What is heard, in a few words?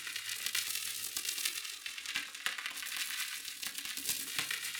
Cracklature, sparse soundscape